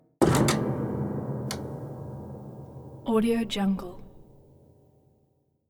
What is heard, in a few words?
piano string abuse